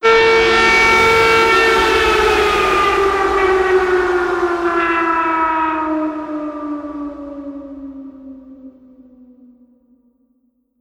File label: Ui Threat Level Increase